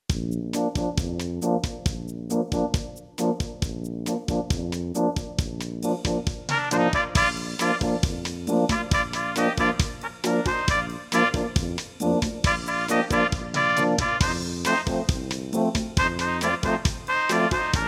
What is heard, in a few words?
Brass Fast tempo